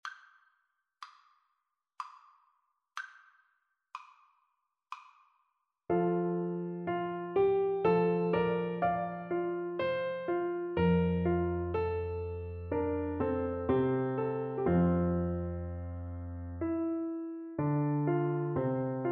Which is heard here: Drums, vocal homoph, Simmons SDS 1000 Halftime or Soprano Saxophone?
Soprano Saxophone